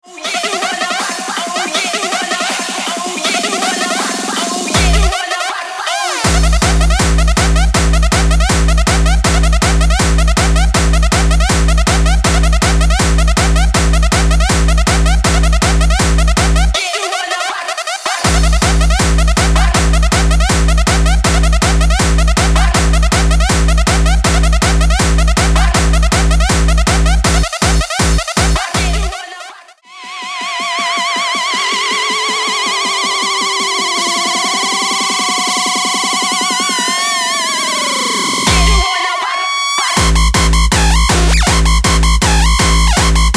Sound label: Drums